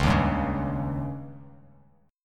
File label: C sharp Suspended 2nd Flat 5th C sharp Bass Standard; JGuitar